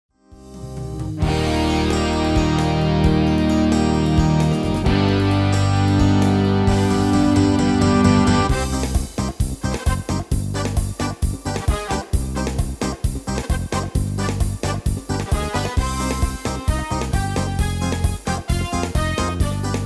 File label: MIDI